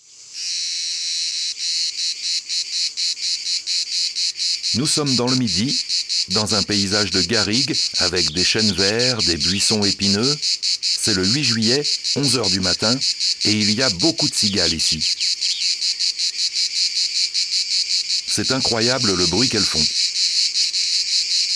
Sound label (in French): Cigale commune; ABC de la nature